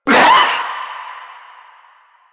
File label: Vocal Short Attack